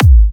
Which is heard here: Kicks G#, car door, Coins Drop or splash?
Kicks G#